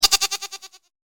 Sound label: goat